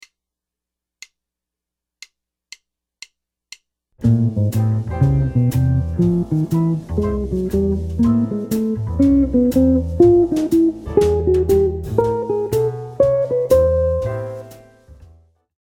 arpeggio enclosures, guitar

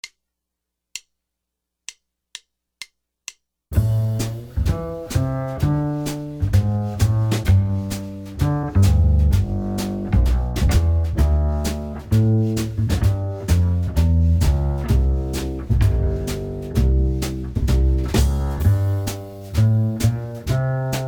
Chord Licks, jazz guitar

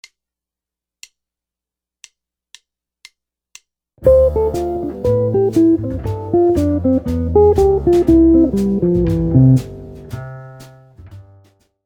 licks, jazz guitar

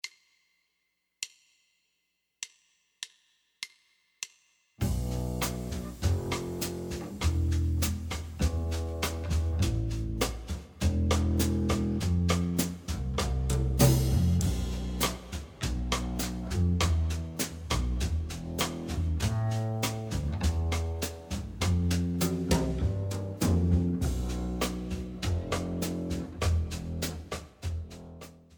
backing track

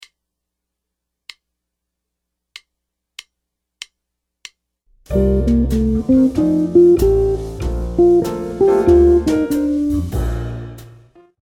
ii V I licks, jazz guitar

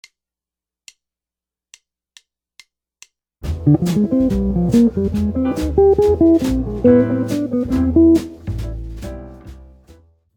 licks

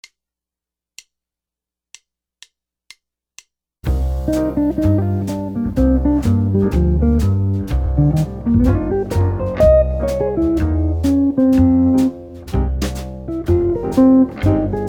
altered scales, guitar

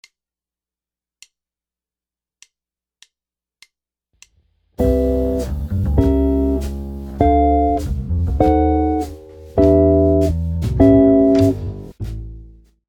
jazz chord progression, guitar

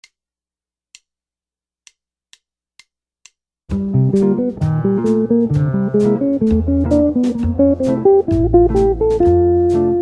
lick, jazz guitar

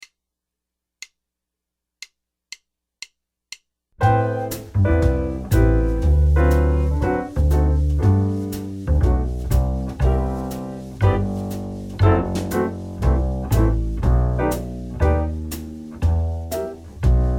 backing track

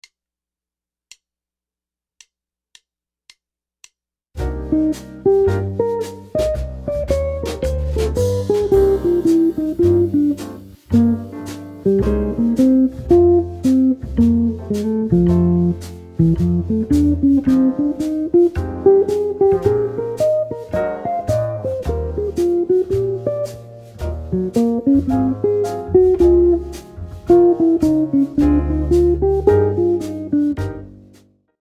ii V I licks, jazz guitar

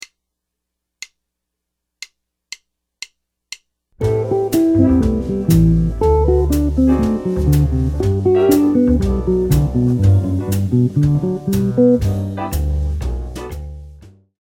arpeggios, guitar

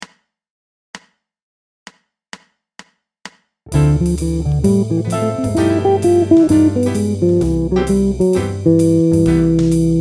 2-5-1 guitar lick

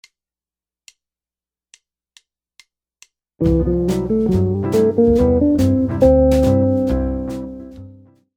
jazz guitar lick minor

